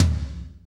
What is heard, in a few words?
TOM, Drumscapes Roland; Northstar; Fast Shuff